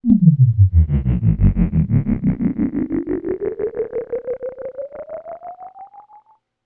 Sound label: Evolving Sci-fi Drone